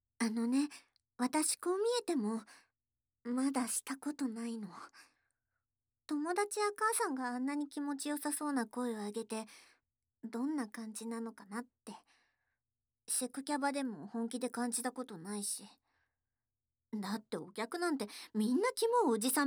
耳なめ＆乳首なめ; やっぱり、耳って男の人も弱いんだ…

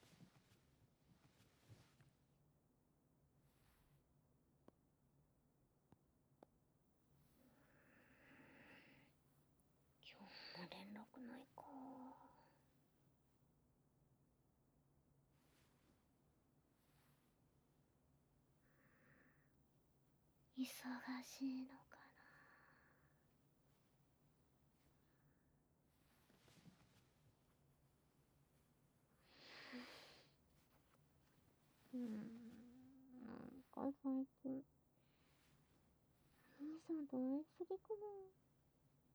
ローターで刺激; 【えちえち仕上がり中】ローターで刺激を求めます

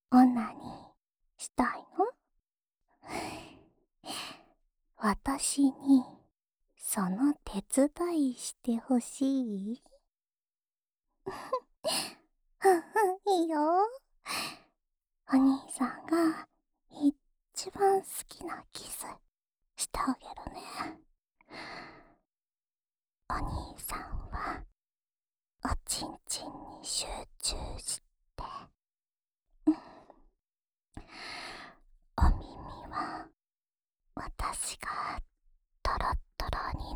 オナサポ用ふっかーい耳舐め（右耳）; ASMR

